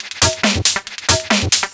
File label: drm 138, Techno, drum-loops; Techno ID; loop-lab